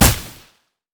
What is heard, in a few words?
axe throw; olaf